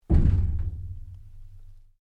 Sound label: Strike Metal Tank Exterior Rattle; Material